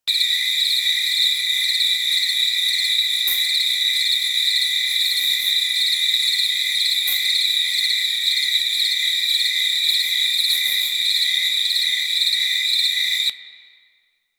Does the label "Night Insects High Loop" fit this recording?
Yes